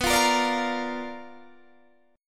B Minor Major 7th Flat 5th, Guitar, Open D

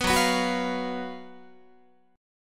F sharp Major 7th Suspended 4th, Guitar

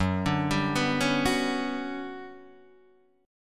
G Major Sharp 11th Gb, chord

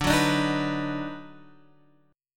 Eb Minor Major 7th Sharp 5th D, chord

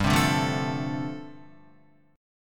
G 9th Suspended 4th, Guitar; strummed; G9sus4

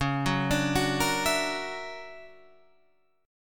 D Augmented Major 9th Db, guitar

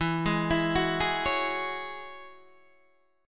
E 6th Add 9th E down Guitar Open D

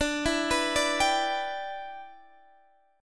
E Minor 7th D

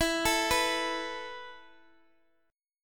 E Suspended 4th E, down, Guitar, Open D

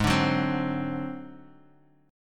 G sharp 7th Flat 5th, Guitar, DADGAD; 6,5,4,5,x,x; down; chord shape

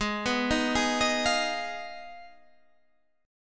F Minor 7th Flat 5th Ab

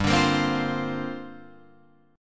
G Minor 11th G down Guitar Open F; Gm11 strummed

